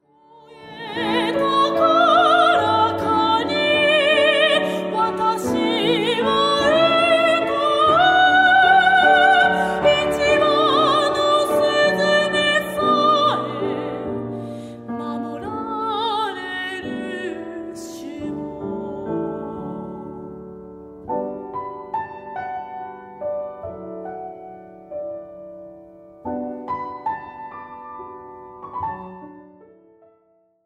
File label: Singing